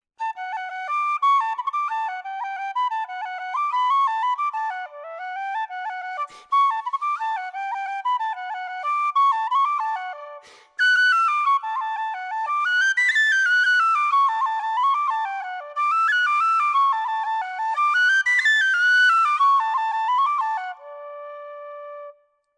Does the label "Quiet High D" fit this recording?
Yes